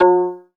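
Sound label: SOLO VIOLIN, Synclavier Strings; ILIO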